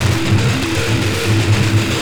Treat Guit, Industrial Nasty

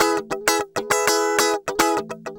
100 BPM

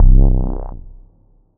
bass stab

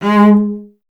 STR VC G#1, Cello; Miroslav Vitous; Solo Instruments VOL-1

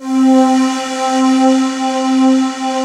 pan pad C4; PANY PAD

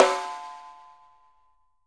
CAIXA RIM, BRAZIL

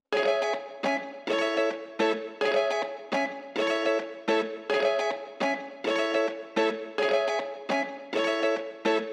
Emaj 105BPM Ukulele; 99Sounds; Monosounds; Music Loops; PANDORA BOX!; Radio Station

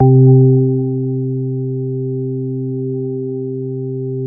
C2, CLUB ORGAN, Roland JV 2080

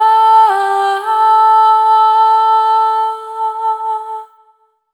Single Voice Melodic Loops, Vocal Samples; Pocket Diva World Class Vocal Samples

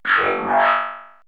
FX VOX

